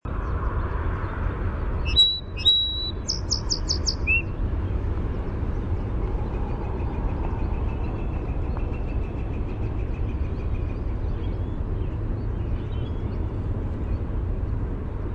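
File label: Song Sparrow song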